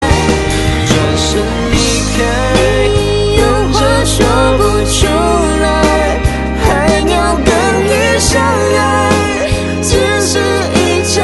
shh

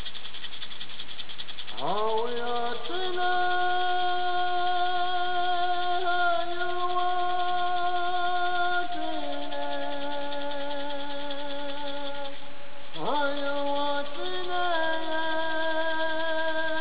indian music

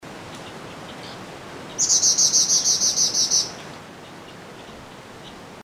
Gray cheeked Fulvetta, birdcall; Alcippe morrisonia; Bird Call Recordings

